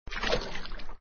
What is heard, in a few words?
Boat Jump; papas pastaria to go; android